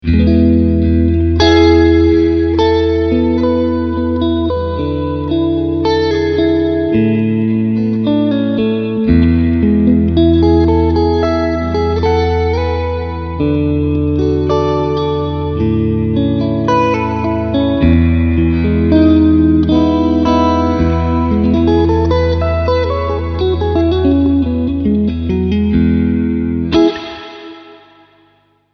Clean Reverb

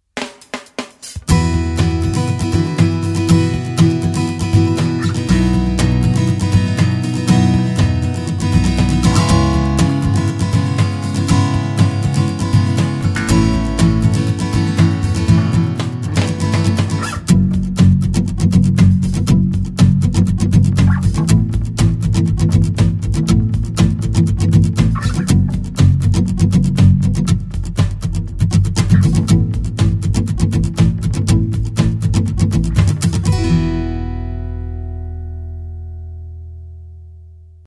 strum

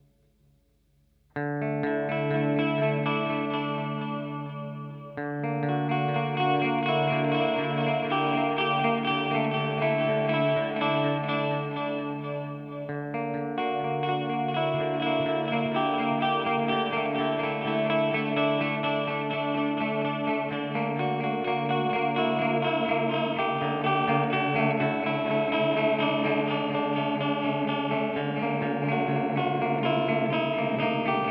Pitch Delay; Cooper FX Arcades

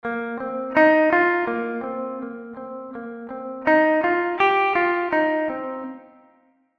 Guitar Medium Bright Guitar Chamber

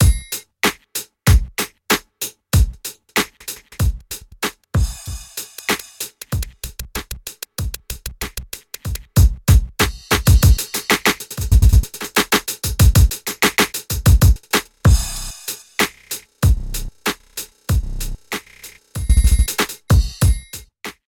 beat Grain

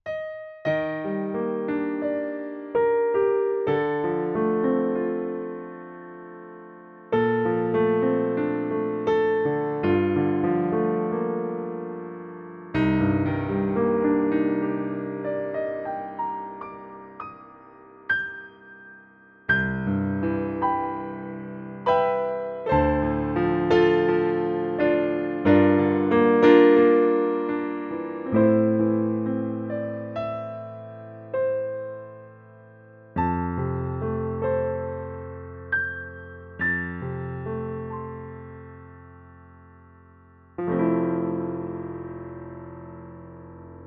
Kurzweil SP6 Dyn 9ft Grand Piano

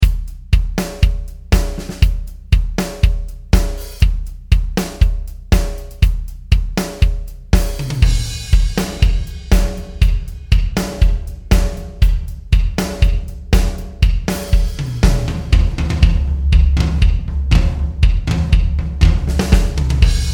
Drums

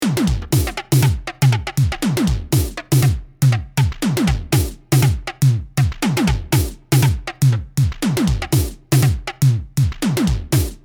analog modulation; ADD-One

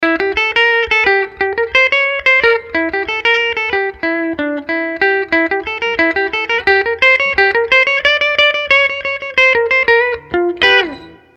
Ibanez ARX 300 Bridge pickup

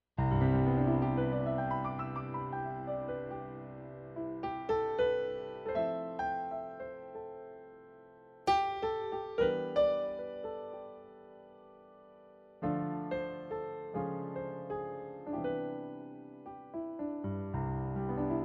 Concert Grand; Tyros5

